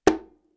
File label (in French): Congas claque etouffe